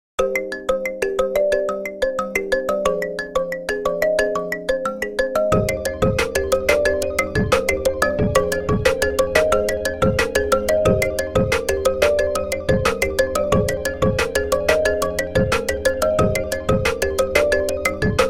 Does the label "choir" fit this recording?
No